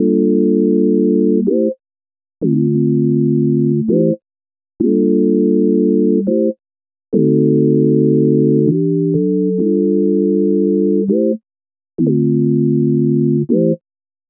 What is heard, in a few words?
100 melody loop afro D min; grant